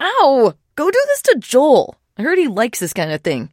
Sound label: trader pain respond; 商人杰恩（七日杀）